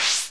slash; doublespeakgames

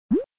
water drop; Puffer Popper

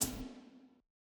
ui quest inactive; Skyrim Special Edition

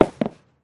lands

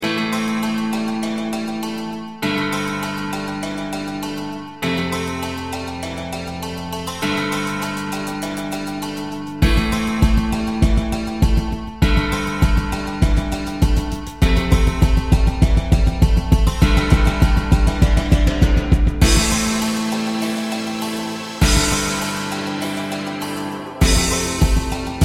oriental techno; Flashpoint Ultimate 13

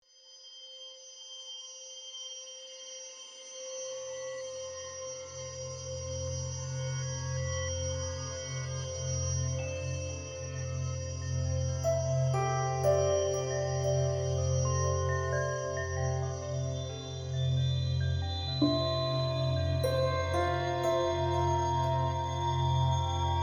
music guestbook

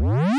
Big Bounce; zj the ball

